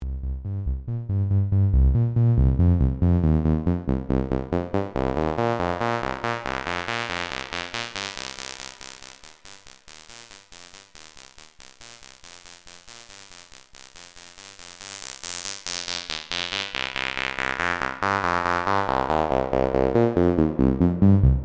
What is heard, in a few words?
doepfer a 121 a 138j